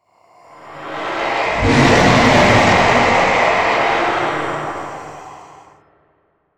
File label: boss ghost roar; blockade classic